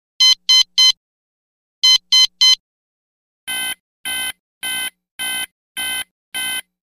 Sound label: Digital audio signal; radio signals